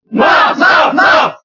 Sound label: Cheer French; Marth; SSBB